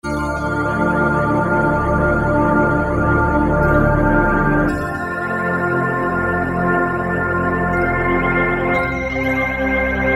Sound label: underwater; Guess the sampler game